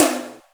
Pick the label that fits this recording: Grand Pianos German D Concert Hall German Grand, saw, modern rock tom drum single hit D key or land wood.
modern rock tom drum single hit D key